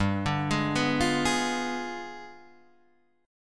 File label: G 7th Flat 9th, Guitar, Standard; arpeggiated; JGuitar